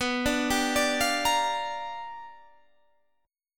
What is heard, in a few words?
G 7th Sharp 9th B chord